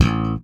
SPECTOR PULL A1, Bass; Sampleheads; Will Lee Bass Library

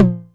Tom Hi, Novation Drumstation 909

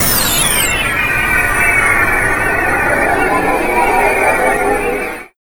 FX SWEEP, SYNTHSWEEPS

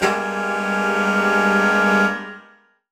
Horn Swell Alt Gmajminb6; undercover samples

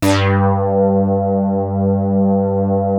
Prophet 5 G3, Synthesizer; Hollywood Edge - Giorgio Moroder Rare Synthesizer Collection

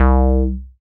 SYN TB SUB, Roland TB-303

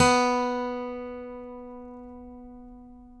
ACGT BOP, ACCGTR PICK

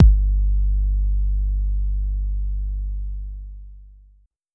Tr-909 Kick Long, Dance

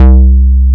BASS, Drum & Bass, 130 BPM; Ueberschall; Drum & Bass Flash Trackz

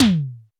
Roland U220 Dry Set

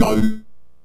Synth Hit A; Zero-G Trance Inducer; 90 s Sample CDs

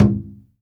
HAIRY DRUM C4; Spectrasonics - Heart of Asia CD2

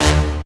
tuners

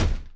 kick, Millenium MPS-400

